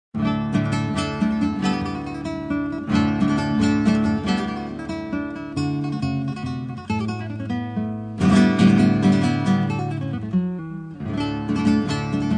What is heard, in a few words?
spanish, guitarist